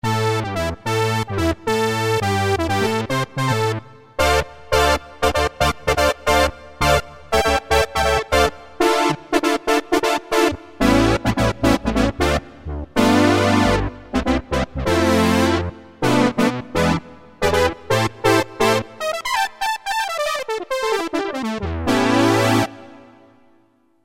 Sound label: Big Brass; Factory A